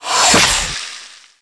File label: hammerhead attack; DAoC